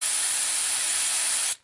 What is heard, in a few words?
Spray, صدای اسپری نقاشی